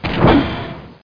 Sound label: MORTAR